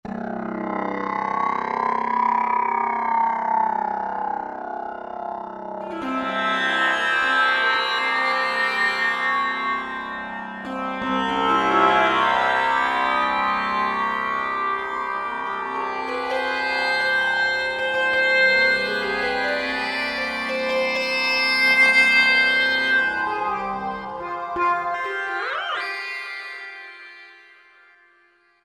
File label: Mid East Drone, Roland V-Synth; Factory Patches